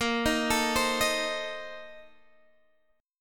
A sharp Major 7th Suspended 2nd Suspended 4th, Guitar Standard; arpeggiated; JGuitar